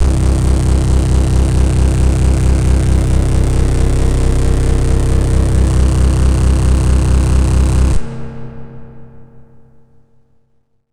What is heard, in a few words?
Venus Multiband, Basses; AMG - Prototype Drum & Bass